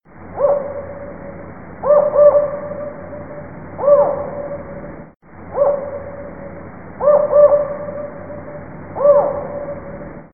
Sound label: Sound of Owl